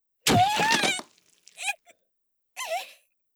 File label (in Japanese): 不意に激痛; 効果音あり